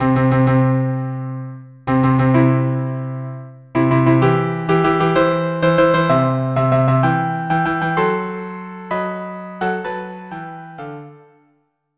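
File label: Wedding violin cello; Mendelssohn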